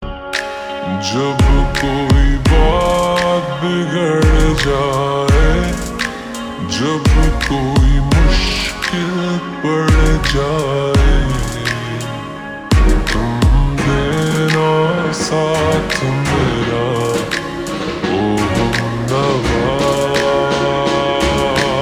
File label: Ringtone slowed and reverb